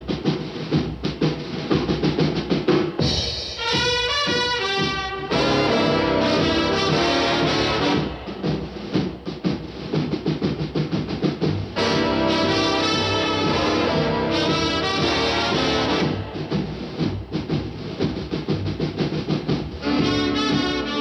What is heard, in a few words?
blues march